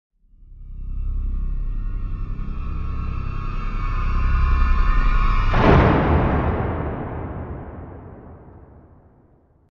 Music stinger - Horror string buildup w big drum hit at end; Hollywood Edge Sound Designers Tool Kit